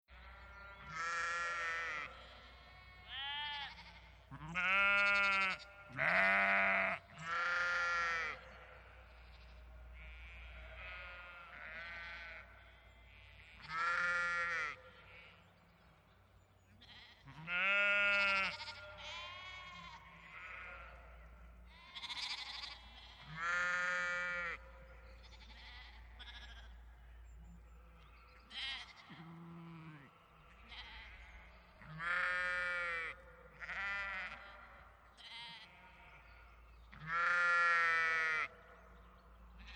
Animals Field Of Sheep And Lambs Bleeting Countryside Daytime Farming; Nature; Sheep Sound Effects